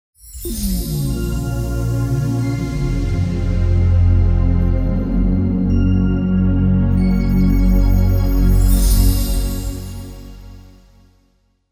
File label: Ambient Technology Logo With Whoosh